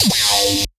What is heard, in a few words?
gabba